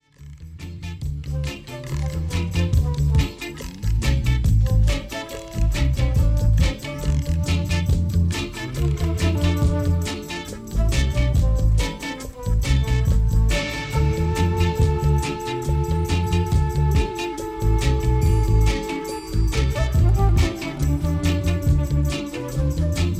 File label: Little Flute Chant